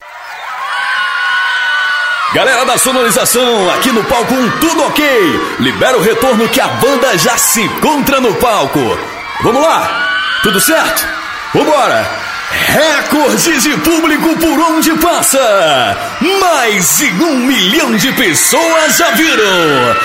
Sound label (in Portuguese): abertura swing